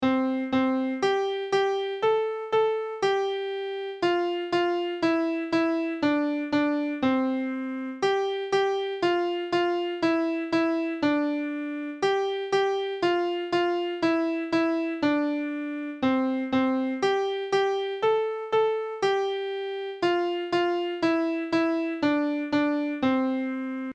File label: Twinkle Twinkle Little Star plain